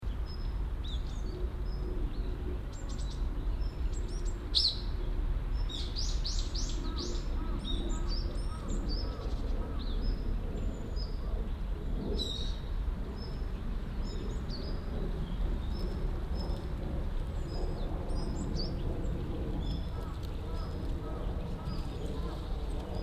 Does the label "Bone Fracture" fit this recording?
No